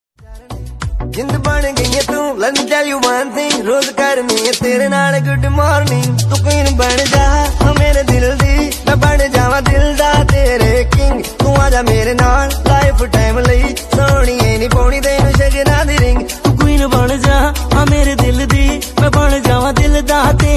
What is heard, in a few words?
Mobile Ringtone